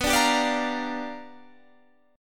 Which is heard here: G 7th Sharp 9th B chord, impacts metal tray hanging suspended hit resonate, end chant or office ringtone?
G 7th Sharp 9th B chord